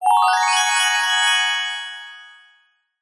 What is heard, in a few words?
fantasy magic glissando rise bell tree style fairy high pitched fast